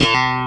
GUIT HIT; E-MU Formula 4000 Series Vol. 1; Hip Hop Nation; Phatt 4000

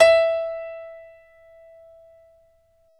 PNO, Grand Pno, Roland; Club-50 - Foundations Roland; Grand Pno 1

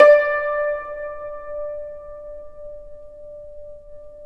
YANGCHIN, D4; INIS - Opium

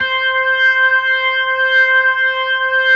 C5, B3

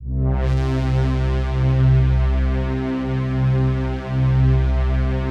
PAD, DIGI-PAD

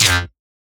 Stab; neuro; Optiv & BTK

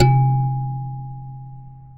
C2 KALIMBA; AKAI S6000; WorldSounds

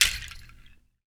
HAND, Afro Shakers, Ankle Pods

